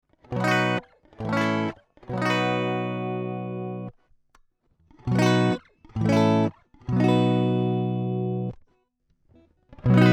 DI Jazzmaster; Heritage DTT-73; 40dB Gain

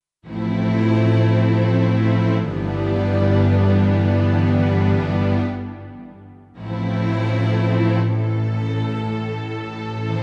Studio Strings Full Tremolo Patch; Steinberg Absolute 5; Full 2

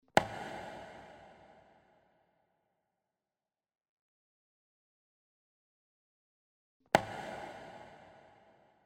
1st No Mod 2nd High Mod

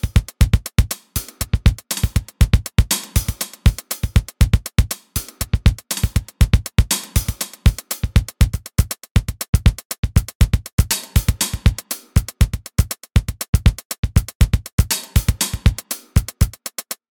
Pattern Variation; XLN Audio Life

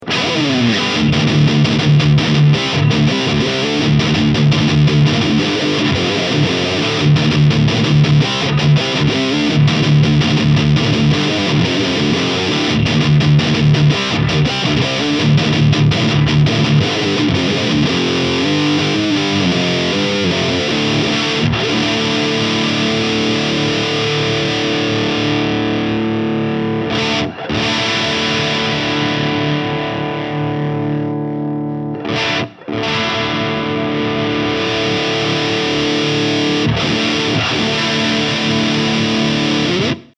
Engl Powerball Distortion High Gain

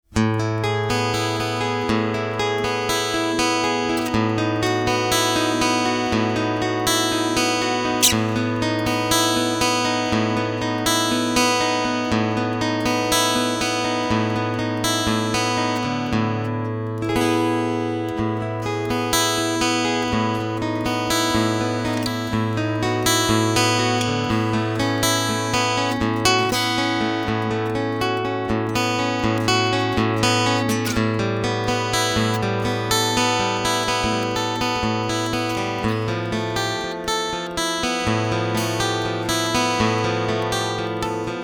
Clean Picking Line EQMAX

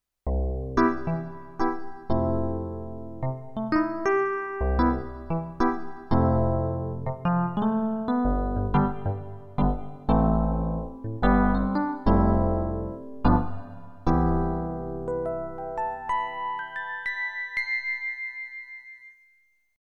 Pianobox E Piano

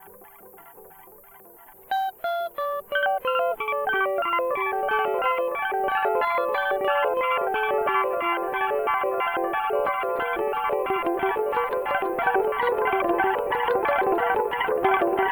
Alexander Pedals Radical Delay Arp

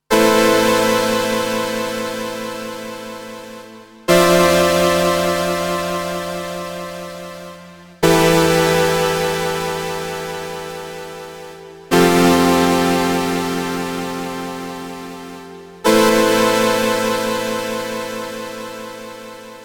Juno 60 Chords HALL; LXP-1

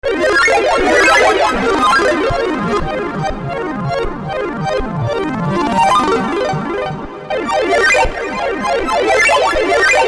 Emulator Arcade

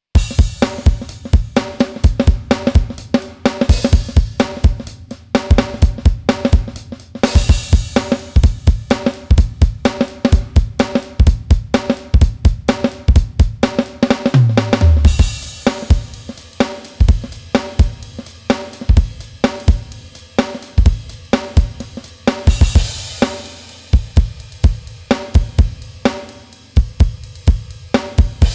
Funk div. Grooves; Harley Benton Dual Effect Double Jammer

